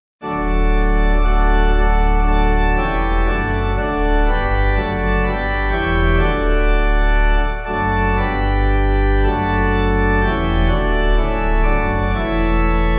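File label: Pipe organ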